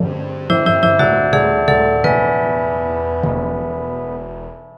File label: victory